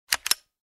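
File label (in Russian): звук вставки патрона в магазин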